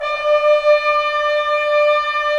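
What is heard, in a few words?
trombones; Roland LA Composer vol. 4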